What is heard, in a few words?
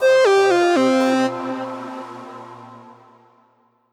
Lose death